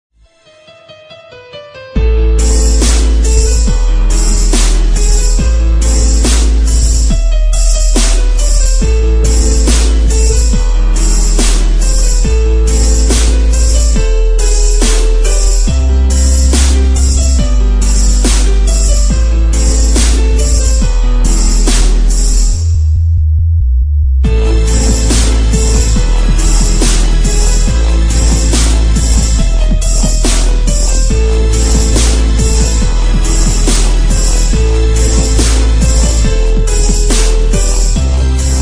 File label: dubstep remix